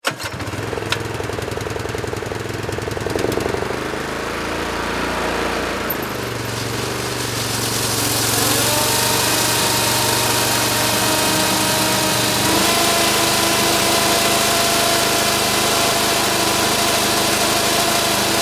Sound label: Lawn Mower, Walking, On, Run, Off; Jacobsen; BlastWave